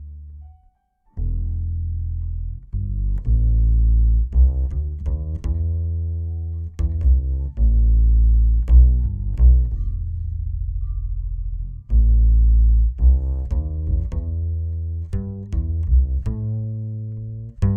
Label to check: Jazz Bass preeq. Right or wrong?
Right